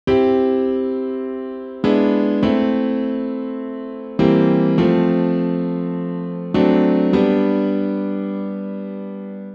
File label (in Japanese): セカンダリードミナント